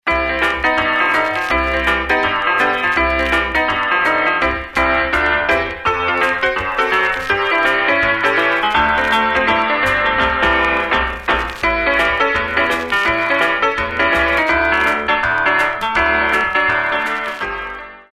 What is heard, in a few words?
Ragtime